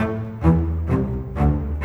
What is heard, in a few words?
Bass Cello; Sonic Foundry (Sony Creative Software); Orchestral Series VOL-4; Rock and Pop; Rock-Pop 15